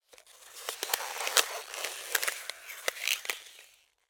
nature ice crack

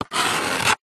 office chalk draw line on blackboard

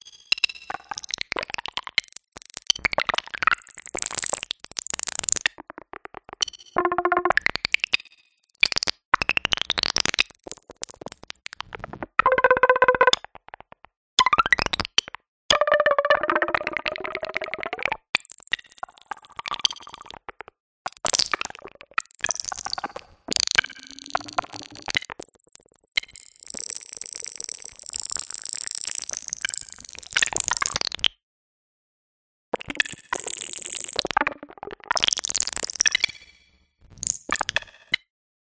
science fiction digital organism glitches; audio mangler

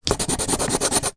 office pencil scribble out on paper

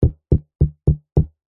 cardboard box small knocking on side; foley

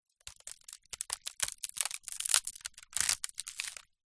foley basket wicker crush snap stress light

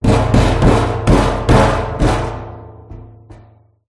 impact metal oil drum empty multiple

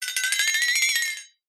cartoon flexatone

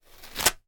toilet paper rip; foley; sound effects; sound effects one

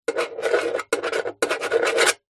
office pen biro writing sign signature metal box

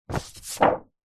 hospital xray sheet pick up from surface

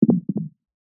human child heartbeat single

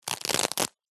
velcro rip first aid kit pouch

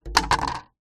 foley insulation tile set down ground; zapsplat

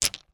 stones pebbles few place down; foley; zapsplat

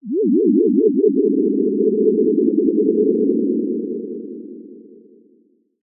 cartoon anime power surge warble whine

